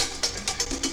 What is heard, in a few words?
drum loop